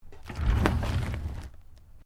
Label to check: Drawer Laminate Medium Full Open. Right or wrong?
Right